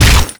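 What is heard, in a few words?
metal gloves hit robot; melee impacts; Garry's Mod; tf2; mvm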